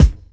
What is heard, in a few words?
smooth kick drum f sharp key, Loudest frequency: 362Hz; kick drum samples